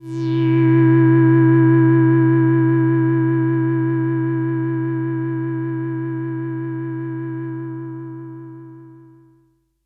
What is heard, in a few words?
SYNTH